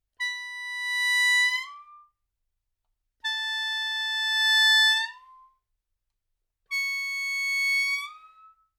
传统笙 演奏技法 上滑音

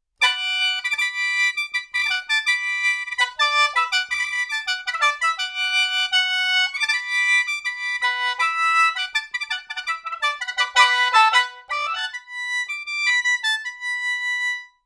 传统笙 乐曲片段

